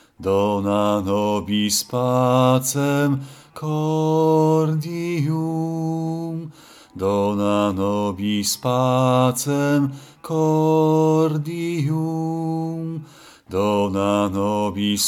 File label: bas; Dona nobis pacem cordium; Taize